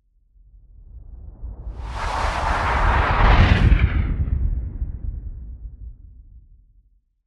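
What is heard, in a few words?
meteorite asteroid sounds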